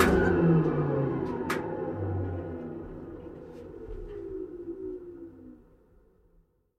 dolgozhdannij zvuk prizemleniya

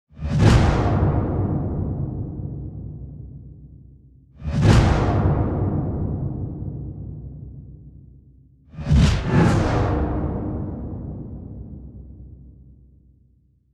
nabor kino- i televizionnih gudyashih pereklyuchatelej s effektom perehoda

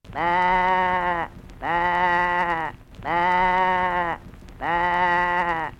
dlitelnoe bleyanie staroj ovci

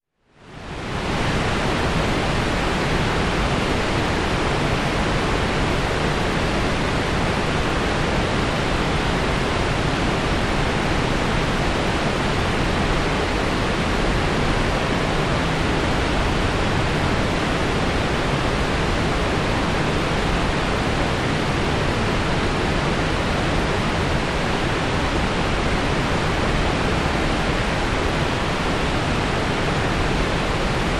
niagarskij vodopad sverhu

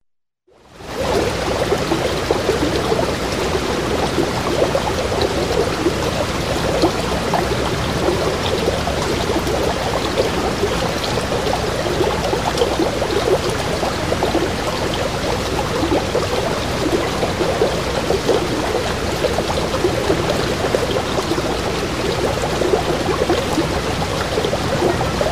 ruchej reka plesk vodi vodnij potok

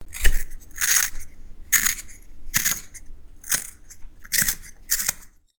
karandash tochat

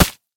rezkij odinochnij nozhevoj udar

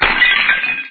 zvuk razbivayushegosya stekla

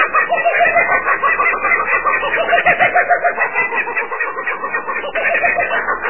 smeh kukabari kak iz filmov uzhasov

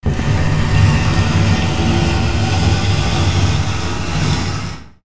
glubokaya strashnaya podzemnaya peshera atmosfera; otkritij multfilm zvukovoj effekt

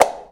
knopka klik v prostranstve blizkii

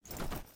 korotkij chyotkij zvuk shitya na shvejnoj mashinke

